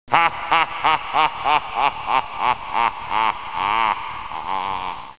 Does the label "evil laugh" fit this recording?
Yes